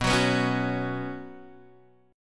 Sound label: B Suspended 2nd B, chord